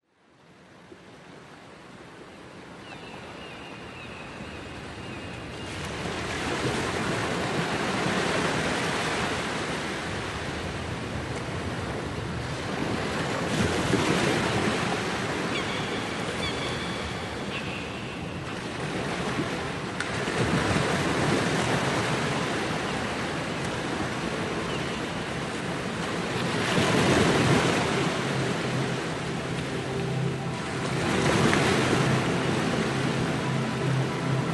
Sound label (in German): Wellen Moeven Sound; h2o - AUDIO ist speziell für Unterwasser Video Filmer gedacht